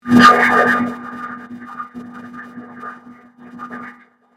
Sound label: Convolution Surge